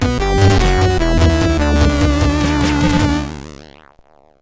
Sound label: Mutant Refrigerator, CSG8580R5